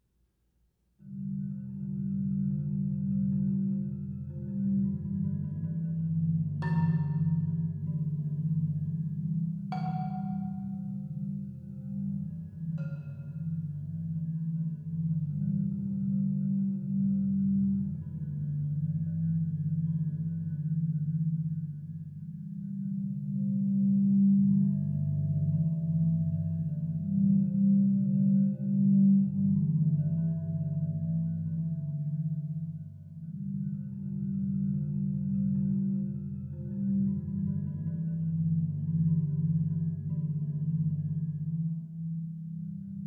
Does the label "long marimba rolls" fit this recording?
Yes